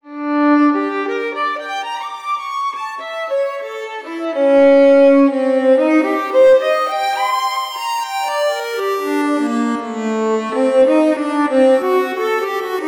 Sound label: Violin Music; Super Neptunia RPG Switch 2019 gamerip